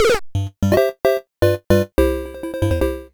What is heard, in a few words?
Lose a Life; Newer Super Mario Bros DS